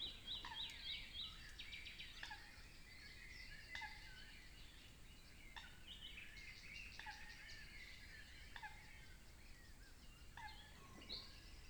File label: Nature European Wetland Dawn Chorus Stereo Binaural